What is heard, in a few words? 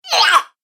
Horror Gremlin, monster